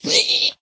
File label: zombie pig hurt; mob; minecraft